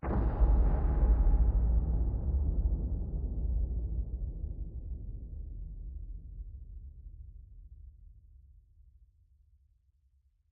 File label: end flash, ambient weather; minecraft 1.21.10